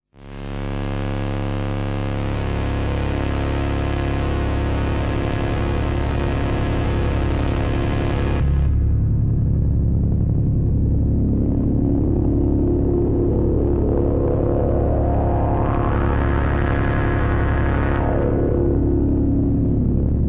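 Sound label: C sharp drone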